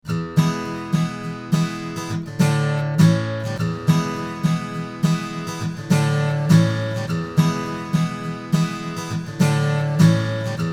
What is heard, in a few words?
Guitar Large Hall; Reverb